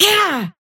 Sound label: pain small